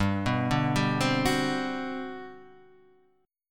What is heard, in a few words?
G Major 11th, chord; arpeggiated; JGuitar